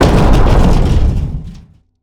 combat explosion; Attack; SpaceshipDemo

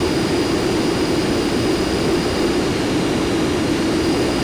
Player Vehicle; Cars; Megacity

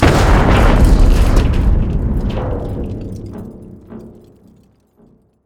combat explosion; Attack

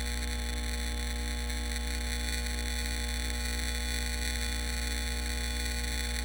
Electric Sign; Megacity

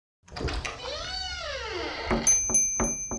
Opening Door; LoadyDungeons

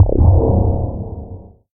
quad damage shoot; ML-Agents; FPS Game

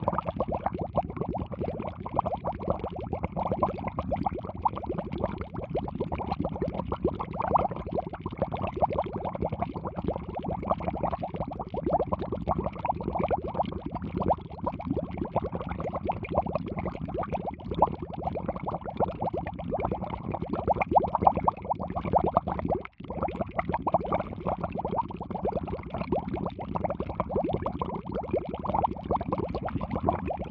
Boiling Water

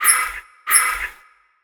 lock release; room 1; SpaceshipDemo

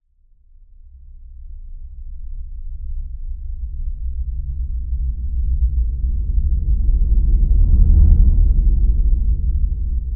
amb low drone loop; room 02; ambient; SpaceshipDemo

